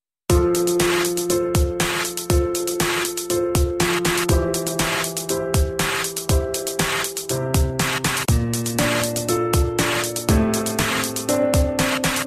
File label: Piano; habbo